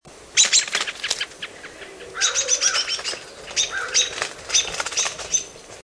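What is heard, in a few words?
American Robin alarm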